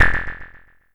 alien phaser

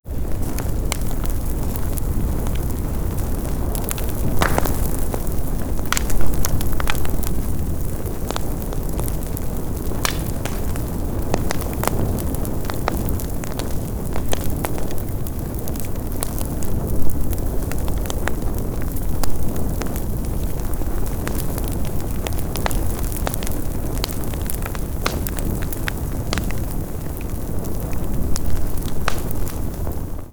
BONFIRE BIG Fire sound effects

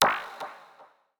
magnet off, futuristic weapons sound effects

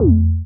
plasma blaster

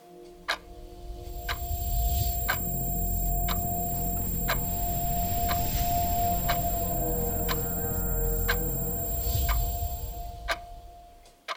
Magic And Spell Sound Effects, chronomancy

